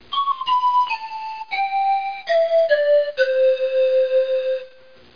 FLUTE; Broderbund Print Shop Multimedia Organizer Deluxe Edition

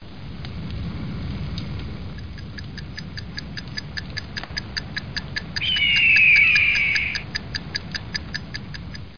radio

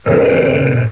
growl

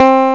fngrbas, tones

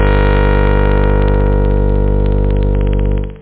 synth; da capo vol1

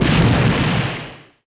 boom; BESTSHOT; noisybit

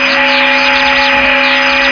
jam hit; XWINGTIE

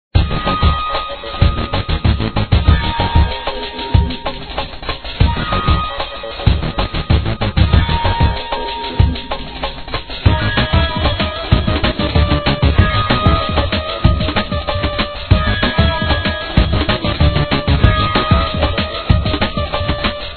music boss; operation graduates

